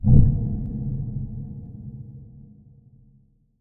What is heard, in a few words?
moan, ghast; mob; Minecraft; Votive World